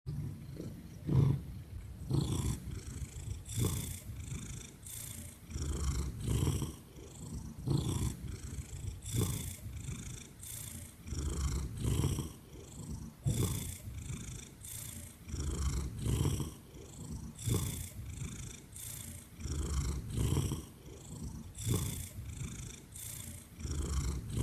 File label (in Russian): kot murchit kogda ego vychesyvajut